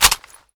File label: saiga hit; svencoop; ins2